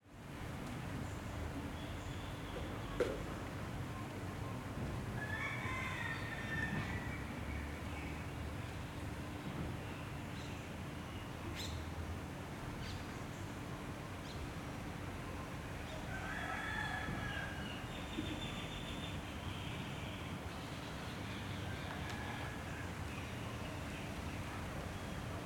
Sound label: THAILAND, KOH CHANG TRAT, WAT KHLONGPHRAO, CALM MIDDAY, BIRDS, DISTANT ACTIVITIES AND TRAFFIC; creativeaudiopool; AUTHENTIC THAILAND SFX-VOL 4